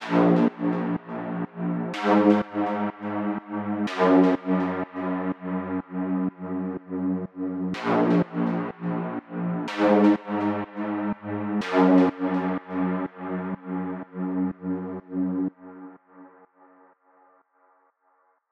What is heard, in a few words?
Synth, 124